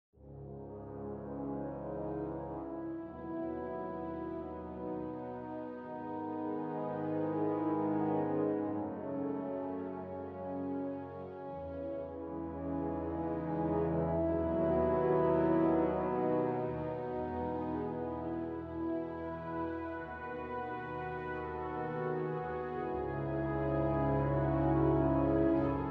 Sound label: Ultrabrass; HydraSynth